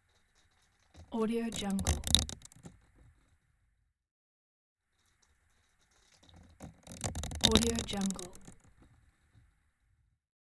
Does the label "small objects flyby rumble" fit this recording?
Yes